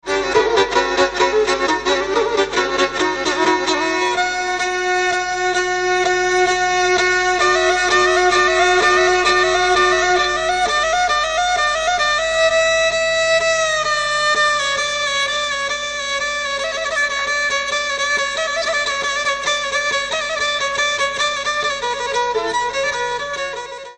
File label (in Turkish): kemençe